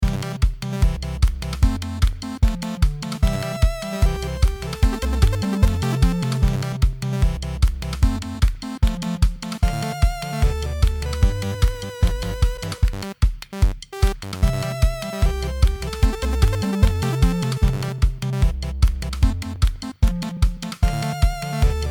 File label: Won Match; raining blobs